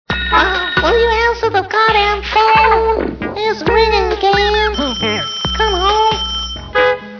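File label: Funtone Songs Answer the phone, ringtone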